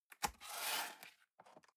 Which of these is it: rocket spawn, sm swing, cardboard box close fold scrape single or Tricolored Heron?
cardboard box close fold scrape single